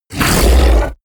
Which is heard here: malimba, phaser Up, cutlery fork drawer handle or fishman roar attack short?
fishman roar attack short